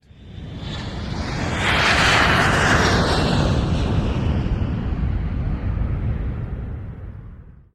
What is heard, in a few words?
jet